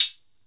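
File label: crunch closed hi-hat; Hats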